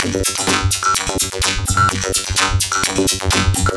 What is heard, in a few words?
Tech Glitz, Drum Loops, 127 BPM; Sonic Foundry (Sony Creative Software); Underground UK House & Electro